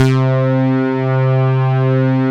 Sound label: OB BASIC